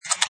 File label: Mechanic, Button sound; Multimedia